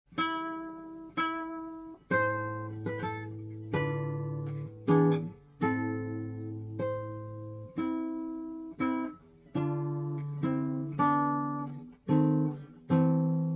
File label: Larghetto alla siciliana; Carulli